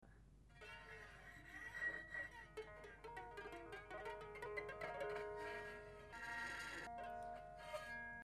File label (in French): archet mix, harpe; touchers de la harpe